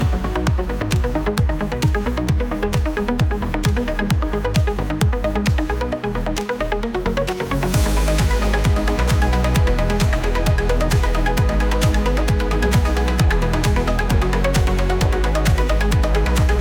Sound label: TechnoBIT